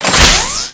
jet gun shoot start, weapons

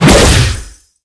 guillotine shoot start, weapons

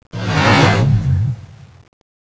grasp, tentaculo; CSO KrakenBoss

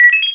ng7 beep, weapons

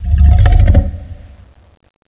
roket end; CSO KrakenBoss

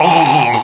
human die; wolfteam

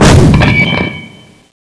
piano gun, weapons

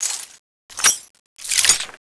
void pistol reload; weapons

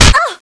hit flesh

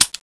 kingcobra shoot empty

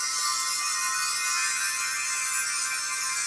magic knife charge idle, weapons

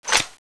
plasma clip out, weapons

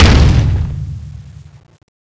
crossbow exp, weapons

